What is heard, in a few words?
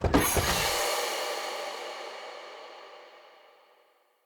Chest Open